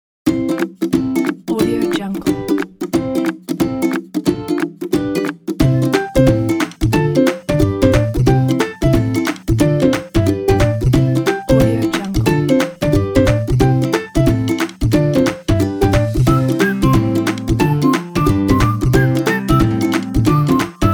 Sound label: a happy